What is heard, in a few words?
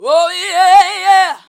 VOX, Singin